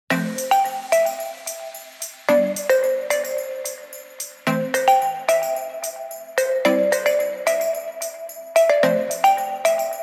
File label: Upbeat